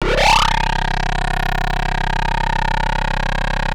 Anasound Long lo C, Industrial Analog Single Notes; Sonic Foundry (Sony Creative Software)